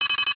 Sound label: Bomb Finished; netent; narcos